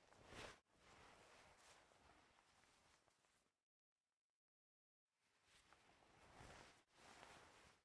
Player Move Air; MiSide

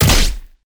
Scanner Hit; MiSide

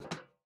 Step Iron; MiSide

